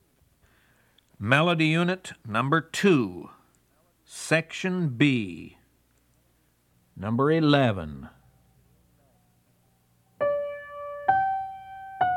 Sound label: melody, ear training recordings